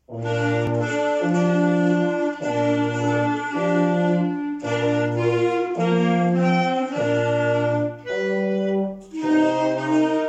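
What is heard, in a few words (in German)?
bläserklasse jingle bells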